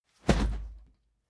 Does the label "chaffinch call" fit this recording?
No